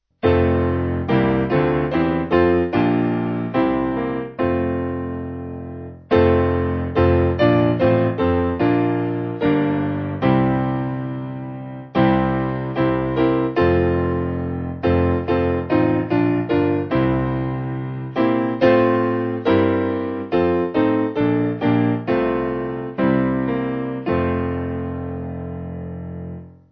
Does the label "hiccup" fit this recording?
No